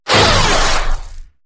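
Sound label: crowbar hit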